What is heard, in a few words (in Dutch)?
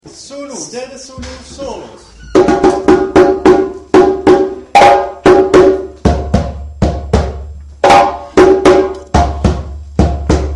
snelle sounou solo